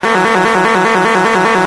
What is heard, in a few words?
alrm